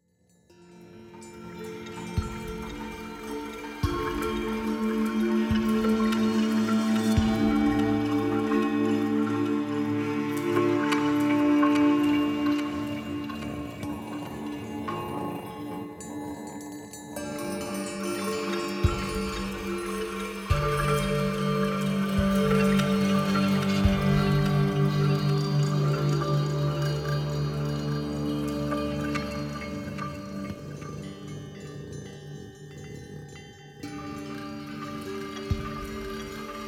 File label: Mystical Bells